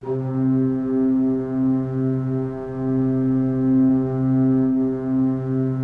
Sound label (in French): Orgue Eglise Strings C4, Pipe Organ Strings